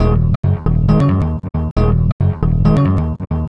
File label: Funk Riff; Sonic Foundry (Sony Creative Software); Synchro Funk